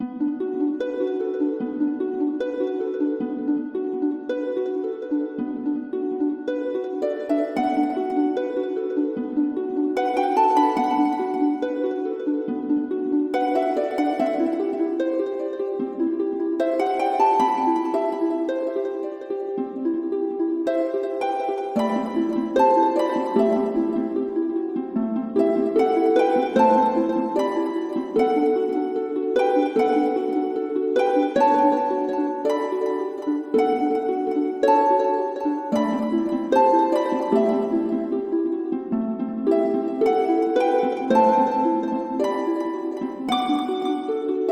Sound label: Slow